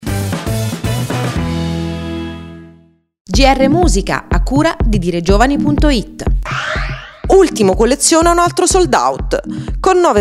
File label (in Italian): musica